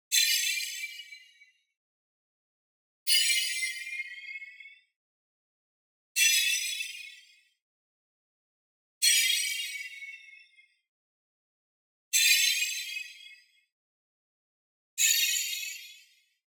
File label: BLING Metal Shing Ping Distant; boom library; Anime Essentials